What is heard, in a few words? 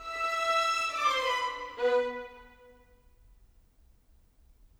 Violins, Orchestral; Sonic Foundry (Sony Creative Software); Orchestral VOL-2; Modern 02